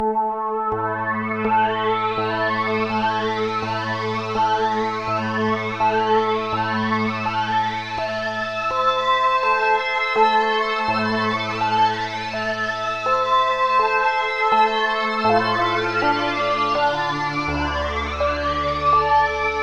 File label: Polysix Poly